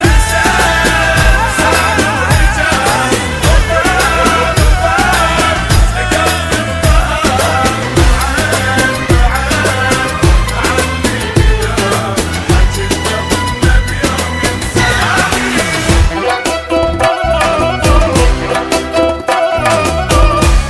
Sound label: iraqi song ringtone